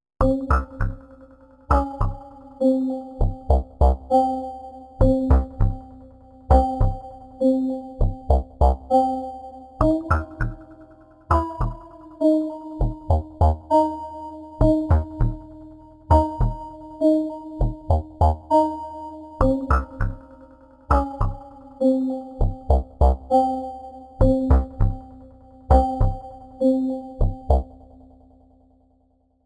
Motors; Umlaut Audio

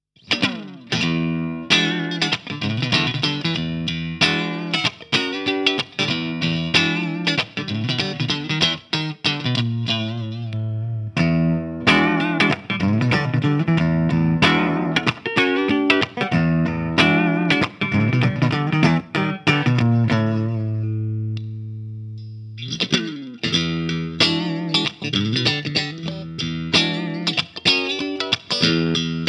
Dean Exile Select 6 String Multiscale Clean; Dean Exile Select 6 String Multiscale Kahler Burled Maple SNBB

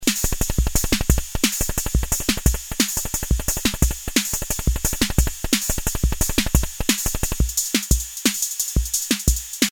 Beat Bend

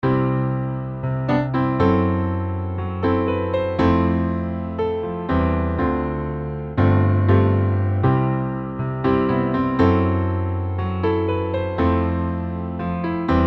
EZkeys 2 Acoustic Songwriter

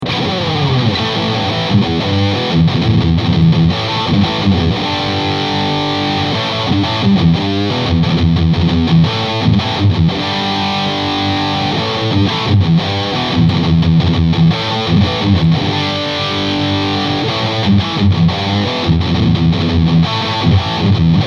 Black Spirit 200 Combo High Gain

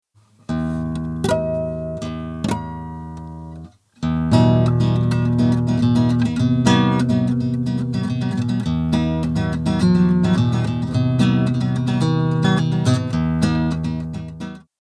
AMS Neve 8801 Nylonstring eq

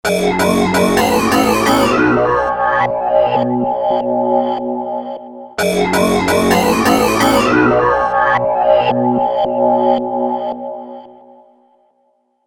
MWXT 48kHz Single Sample VCF PAN modulation Delay; EIII

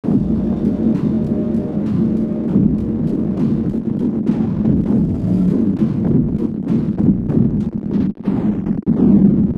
Pitch Bitreduction Samplereduction

